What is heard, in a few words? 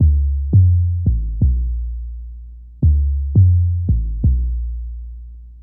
Hammer Bass, Drum & Bass; Fluid Dynamics